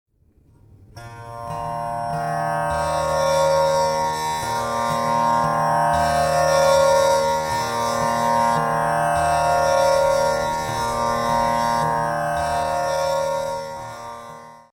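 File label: Tamboura E flat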